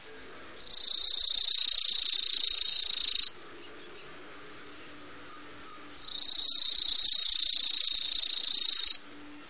strekochushie zvuki sladkogolosoj ptici

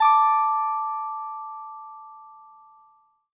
gitarnie melodii struna b s garmonajzerom

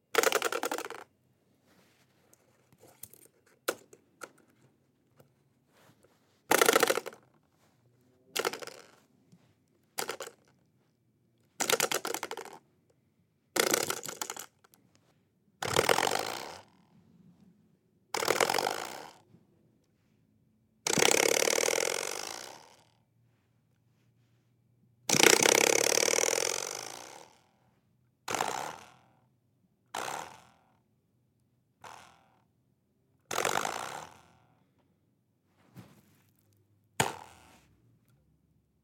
metallicheskij pruzhinnij ogranichitel snachala nemnogo otkruchivaetsya a zatem fiksiruets

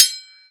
mech priglushennij v prostranstve

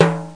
timbales

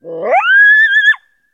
layushij skripuchij zvonkij krik kojota

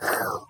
ataka paukov korotkij zvuk

